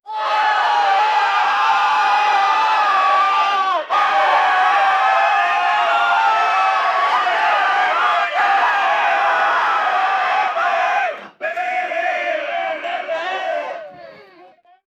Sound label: a shouting match with, argument